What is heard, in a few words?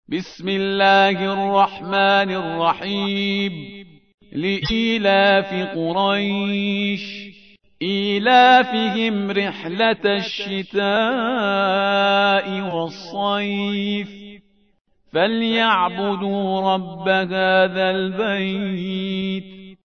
Tarteel Quraish; Parhizgar